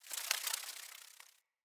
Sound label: Amb Corrupted Critter Close; Ambience; Mendicant Area